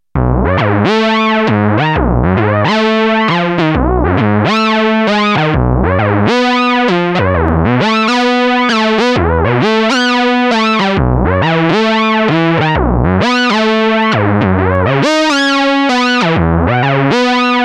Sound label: Another solo, Novation Bass Station II; analog monophonic machine with subtractive synthesis